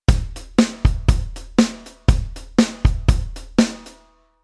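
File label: Rhythm 8 swing hi-hat; SD BD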